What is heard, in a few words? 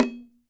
TASHA, ETH PERC; WorldSounds